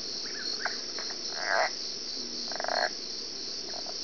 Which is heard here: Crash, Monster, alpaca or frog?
frog